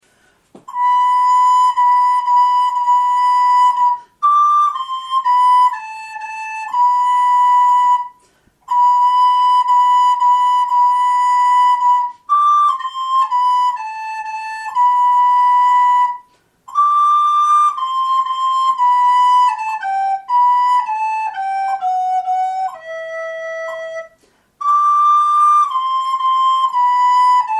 Pavane G Em, FLUTE

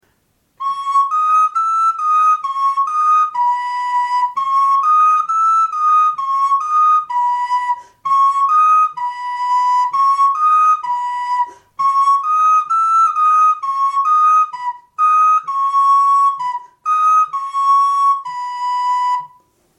carillon, flute

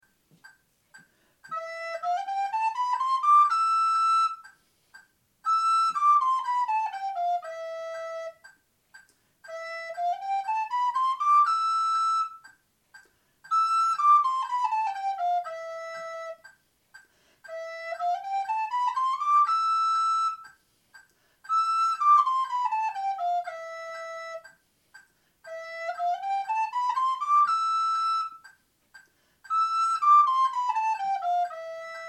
gamme, flute

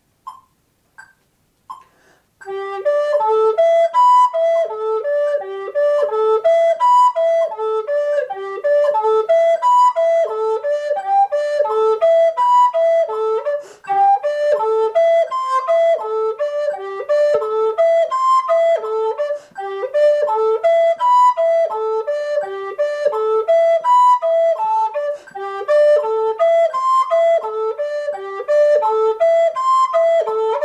carillonneur, flute

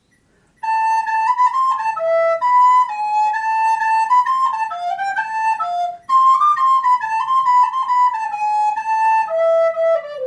danse papillons, FLUTE

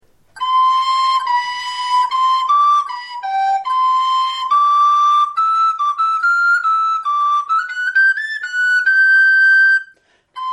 Air de cour soprano, FLUTE

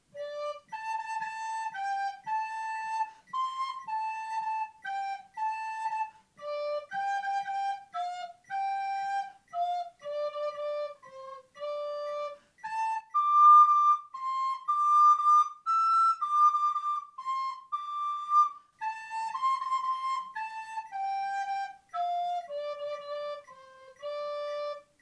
Colchiques, FLUTE

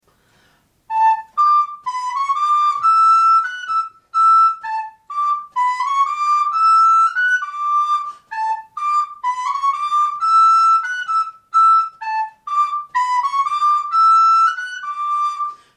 cotillon, flute

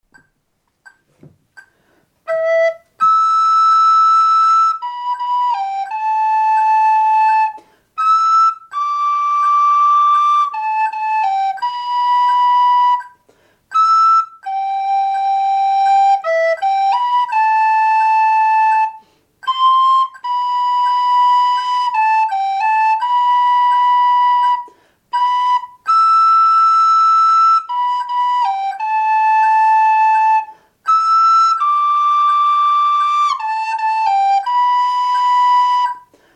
migrateurs, FLUTE

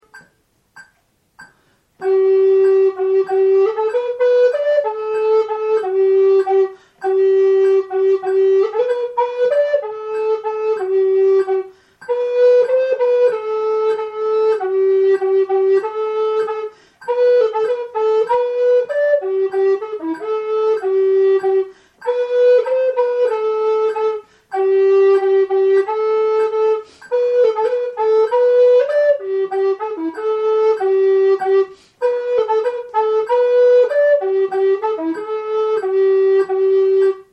Gavotte, FLUTE; caroubel

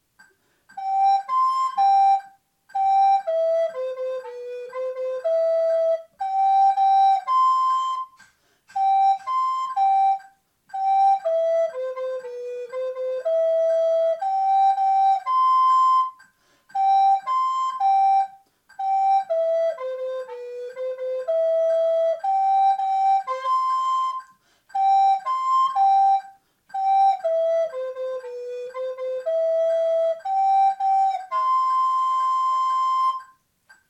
gamme, flute

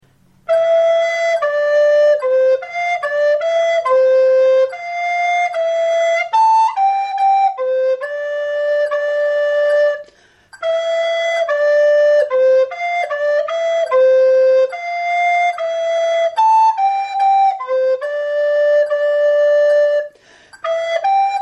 Air de cour alto, FLUTE

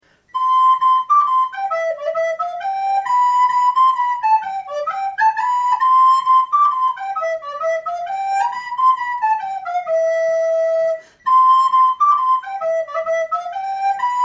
danse papillons, FLUTE

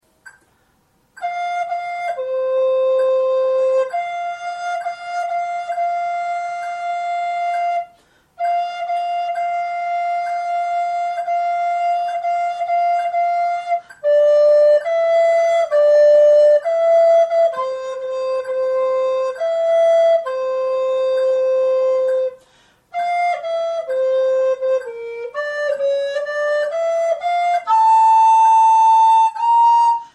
Rossignol, flute

